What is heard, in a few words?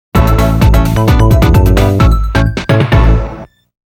Bonus Game Jingle; Clock Work Aquario